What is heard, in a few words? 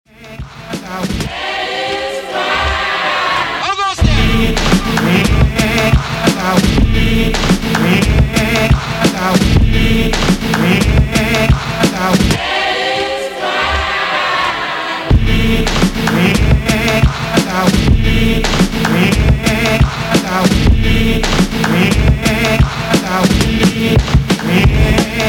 ruff church break